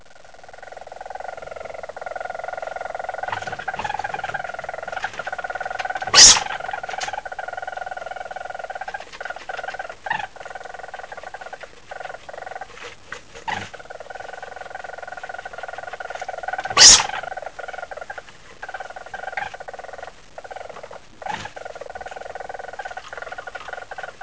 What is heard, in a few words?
weasel